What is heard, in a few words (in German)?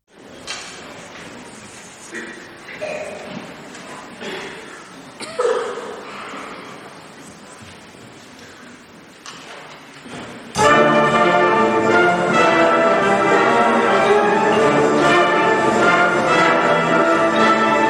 Orchester in Konzertsaal; Orchester aus Genf